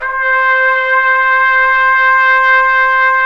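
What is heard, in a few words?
BRS TPT P, Brass Trumpet p; Roland L-CDX-03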